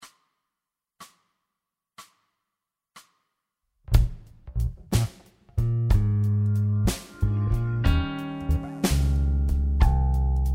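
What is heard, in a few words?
Slow Blues in Bb Rhythm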